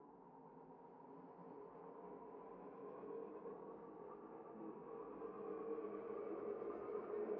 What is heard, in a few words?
FX